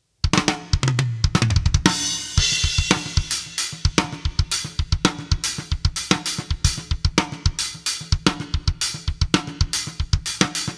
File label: linear groove